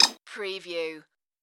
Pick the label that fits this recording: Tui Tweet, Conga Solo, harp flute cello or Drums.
Tui Tweet